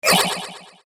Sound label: Magical Ripple Sound, fantasy sound effect; Magic Sound Effects; Andrew Fleming